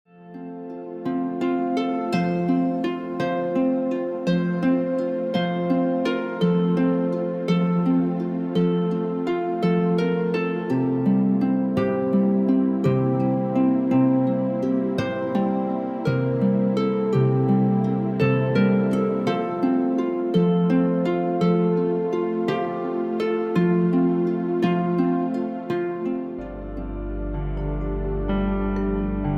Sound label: Baby Lullaby Mix